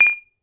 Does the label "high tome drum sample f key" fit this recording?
No